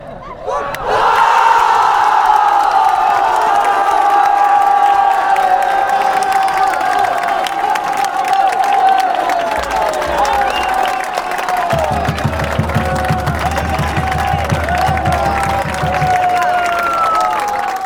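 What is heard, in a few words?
gol villarreal, football chant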